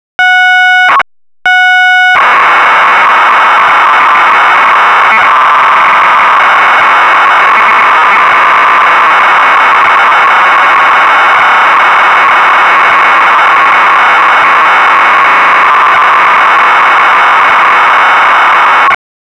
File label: FLYCATCH